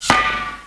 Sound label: hurt, paintball